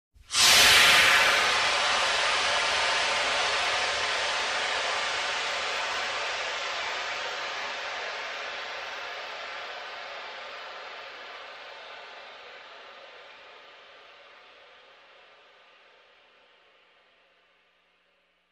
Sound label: Tire Deflation